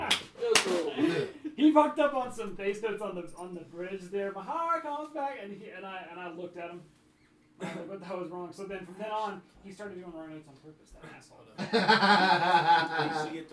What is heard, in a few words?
bass bits